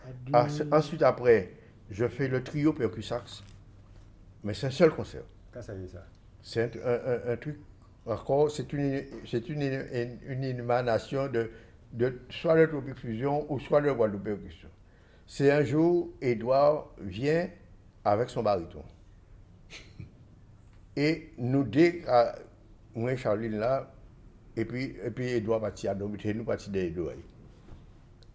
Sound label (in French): Trio Percus Sax